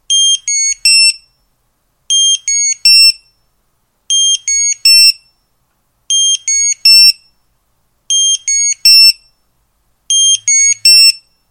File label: Swissphone BOSS925V